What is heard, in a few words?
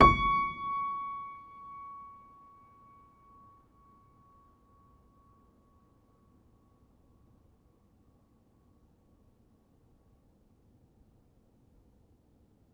Player, Upright Piano; HSS OP Pack; healing soundscapes